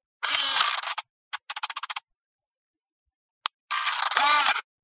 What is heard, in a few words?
Camera Zoom Shutter